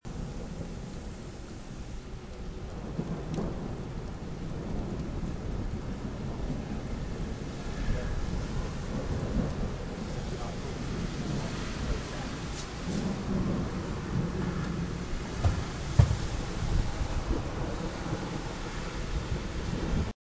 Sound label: thunderstorm